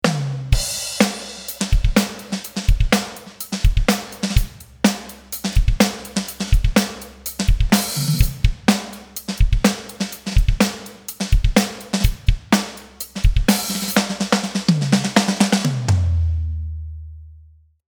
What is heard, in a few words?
Room Example 125, Lo-Fi Analog Drum Breaks; VHS Break